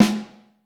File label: Vintage Snare Drum